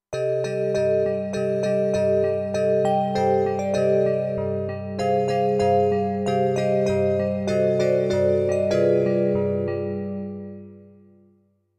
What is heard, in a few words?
Christmas Bel